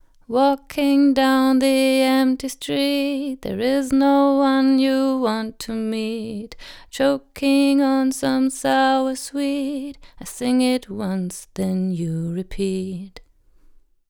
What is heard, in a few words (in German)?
Tubesessor Vocal unbearbeitet; Heritage Audio